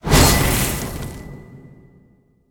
fire activate; smite; god merlin

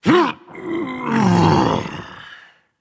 Grunt Lift, Dragon; fafnir

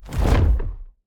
wing big; smite; god cthulhu

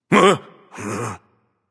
nv fear, vox; smite; voicepack; vulcan

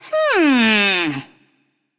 thinking; cupid

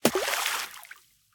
splash small; smite; poseidon

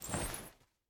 armor long; smite; hera

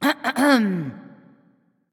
clear throat; smite; medusa

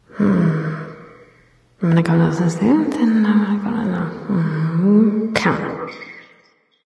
grumble light; smite; voicepack; hel

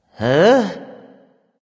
confused; smite; voicepack; guanyu

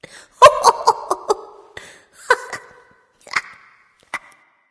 hehheh; smite; jingwei

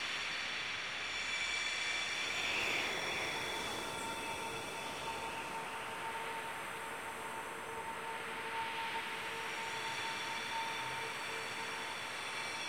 ambience good wind; smite

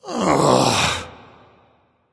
"ugh"; smite; voicepack; ullr

